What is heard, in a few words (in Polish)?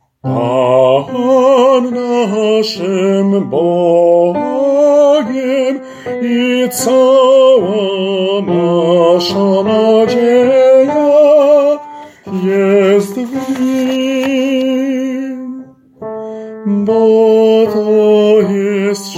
BAS; Pan naszym Bogiem